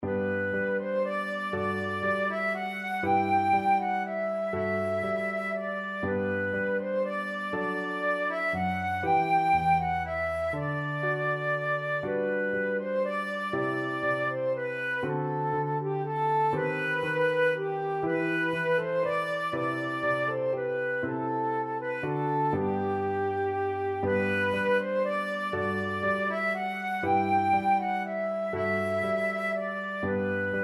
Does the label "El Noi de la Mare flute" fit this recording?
Yes